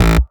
Synth Cuts; Vengeance Samples; VES1 Instruments

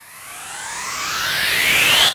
Uplifter

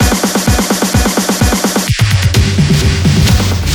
Fill Ins 128BPM; Vengeance Samples

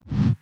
BD Gate FX, Bassdrums; Vengeance Samples

